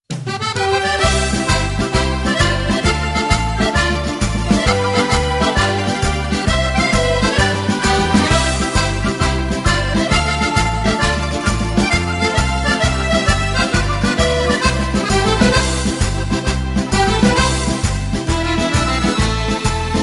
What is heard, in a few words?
Tarantella